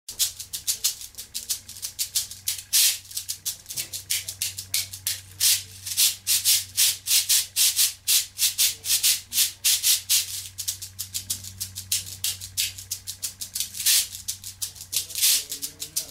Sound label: cabasa